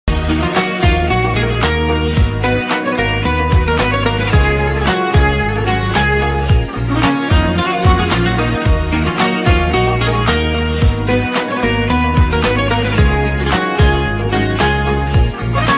sad instrumental ringtone very sad song bgm ringtone